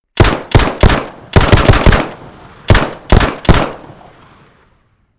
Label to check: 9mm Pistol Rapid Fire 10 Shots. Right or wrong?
Right